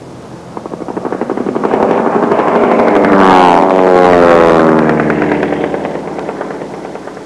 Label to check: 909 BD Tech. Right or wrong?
Wrong